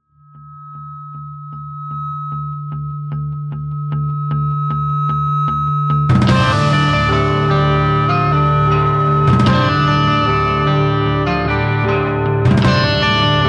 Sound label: guitar